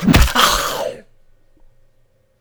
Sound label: zombie attack